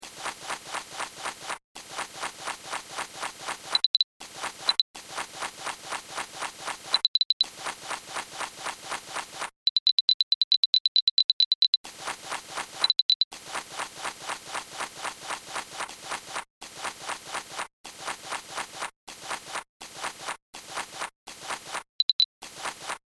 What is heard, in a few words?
Web Beeper